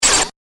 Laser Zap High Intensity, laser gun, science fiction sound effect; star wars / trek style games